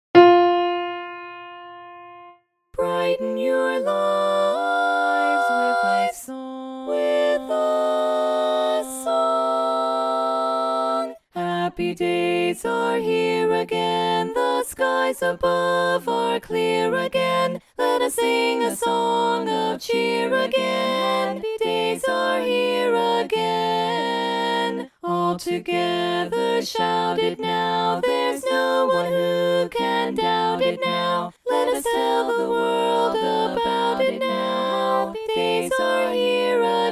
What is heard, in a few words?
Tenor Dominant